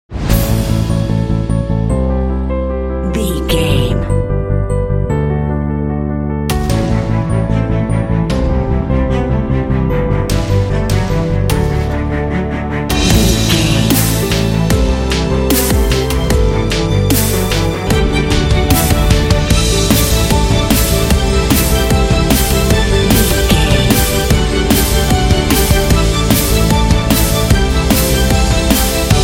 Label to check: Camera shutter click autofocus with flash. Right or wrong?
Wrong